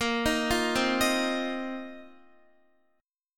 Bb Suspended 2nd Suspended 4th Bb down Guitar; Bbsus2sus4 arpeggiated

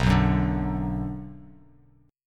B Minor B Bass Standard; strummed; JGuitar

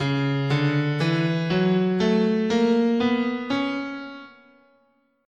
Db Symmetrical, scale

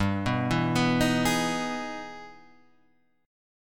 E Major 7th; Emaj7/G; arpeggiated; JGuitar

